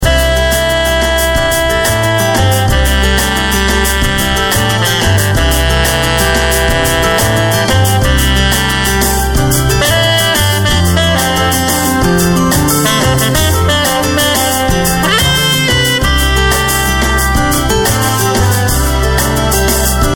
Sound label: Playin' sax